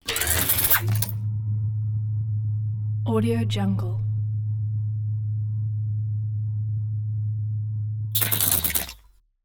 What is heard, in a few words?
utilities sensor